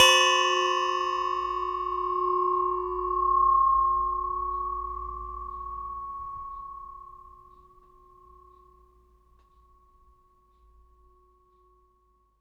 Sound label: Metal Teapot Stand Strike